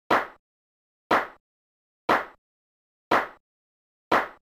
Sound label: testclap; superdirt supercollider